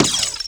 DMA XD-5, DIGITAL; 90 s Sample CDs; Sound & Vision - Gigapack I CD 1 (Roland)

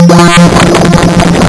lsdj waves; wavetables

